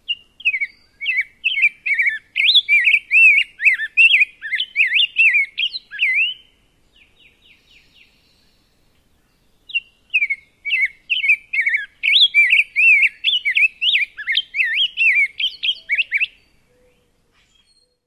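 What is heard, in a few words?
rose-breasted grosbeak song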